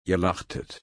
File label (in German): lachen